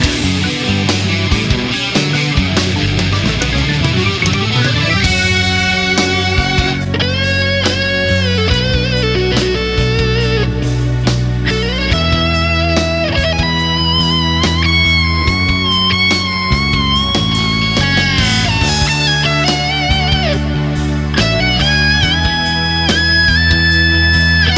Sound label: Soulful Scream solo; Guitar For Hire